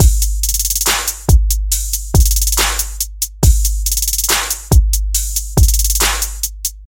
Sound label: HIP HOP DRUM LOOP